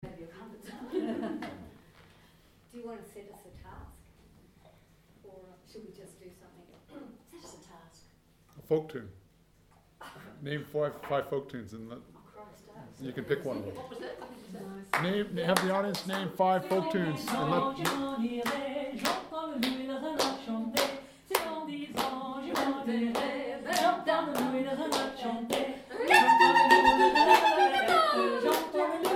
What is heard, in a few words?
Greek folk tune; Saturday Club at John Hargraves